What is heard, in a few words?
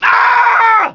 male scream; cs2d; cityrp; pedestrian